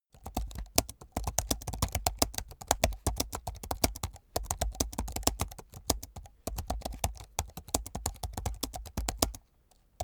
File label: keyboard laptop type sequence hard